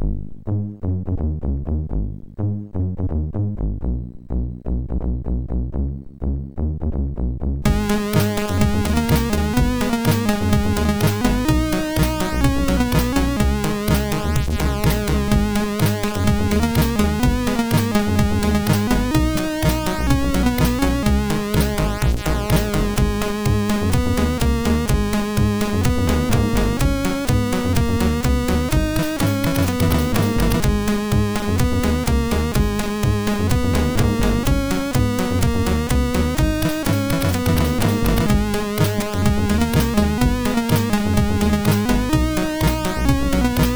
Music, MOS6581R2

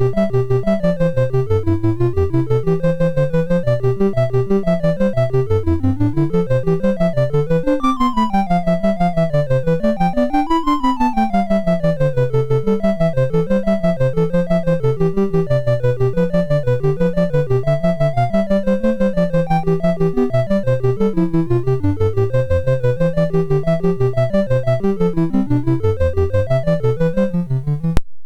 Picnic Waltz, MOS6581R3

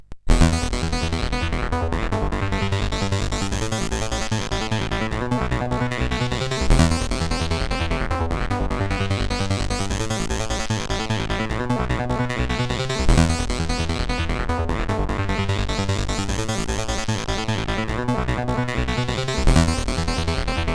Wasted 80s, sid CSG8580R5; hvsc; Zerozillion

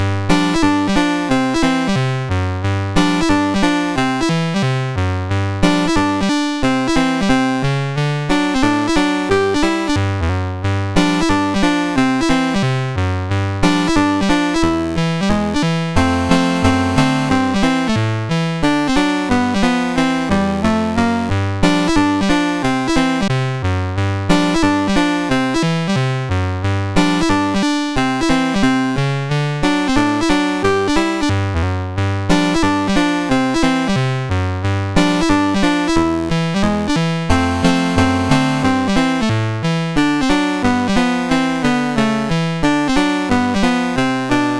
Ragtime Ramble MOS6581R3; Digger

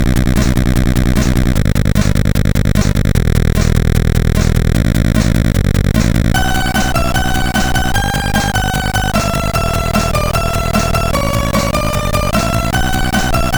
Fast Loader, sid MOS6581

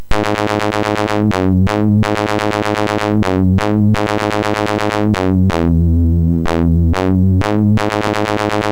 intro sid MOS6581R4

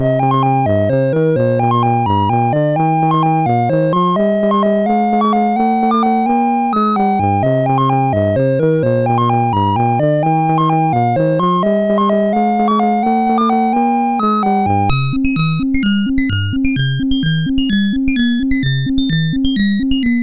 sid MOS6581R4, GAMES; Accolades Comics

